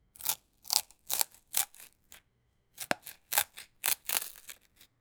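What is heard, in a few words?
sliceing meat on chopping board; culinary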